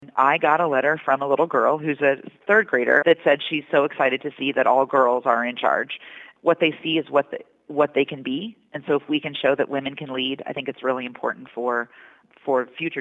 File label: all female